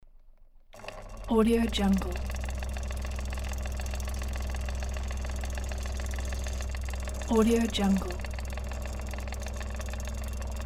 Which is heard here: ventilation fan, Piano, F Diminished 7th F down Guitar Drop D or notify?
ventilation fan